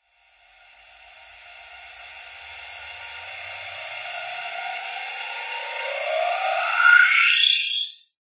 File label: reverse hipass filter thing, Electronic Hits